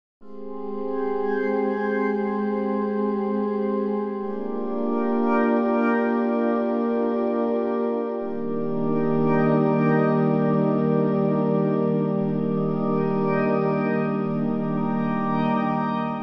Korg SDD-3000 Synth Pad ohne Effekt; UAD

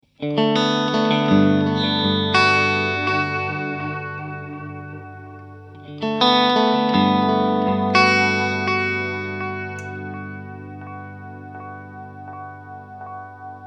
ocean mit freeze

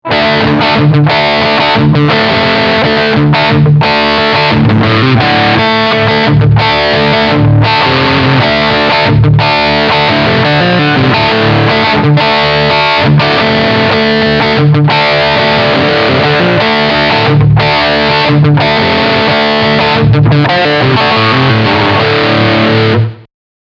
Riff beide Humbucker

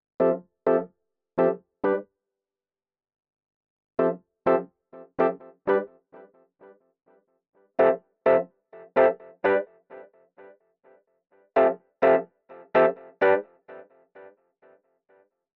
Electro Rhodes mit Effekten

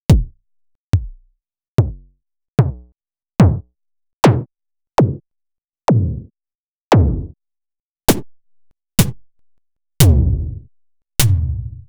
Kickain Stereo Einzelsounds; Knobula

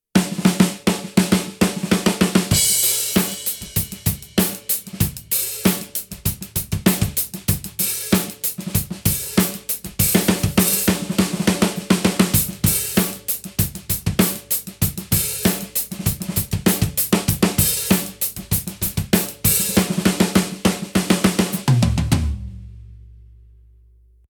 MD mittel mit Gelpad

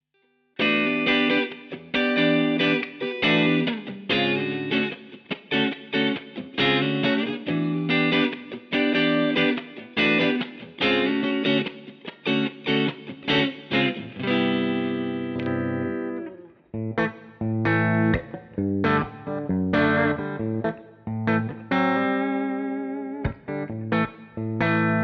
Amber Overdrive Clean Referenz

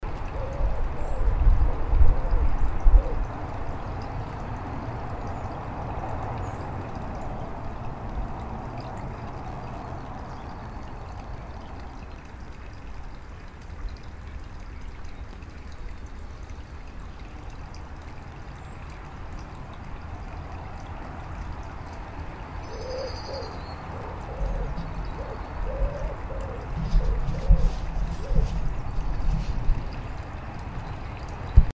irig mic field außenaufnahme

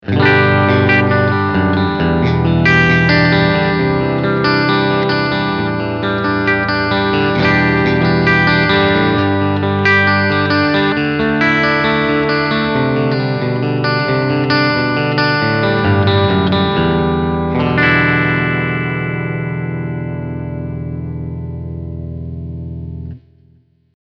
Beide PUs Clean

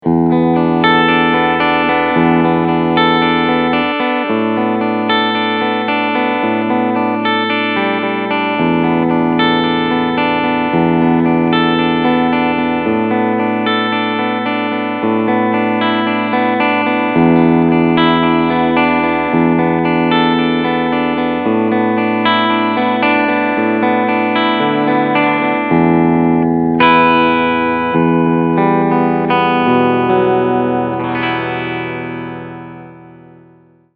Clean Picking Steg PU

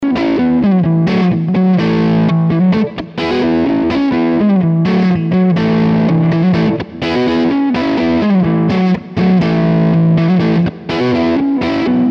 Les Paul 60s Hals Pickup Crunch

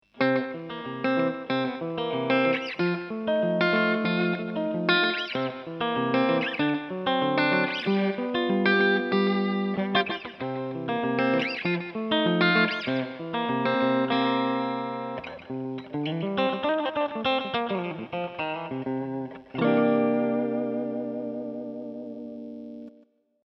Gretsch steg clean

